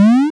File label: damage increase; idledragons